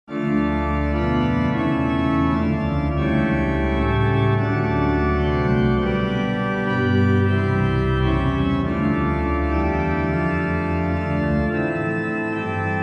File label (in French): Messe Paroisses Kyrie en Taille Plein Jeu, Organ Music, Souvigny; Couperin Francois